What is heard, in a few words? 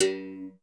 BERIMBAU, SY99; Crypton - DrumBase5000